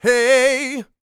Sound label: Vocal Planet R&B & Dance; ILIO